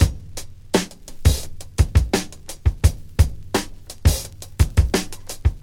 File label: 85 bpm breakbeat sample b key, Free drum loop - kick tuned to the B note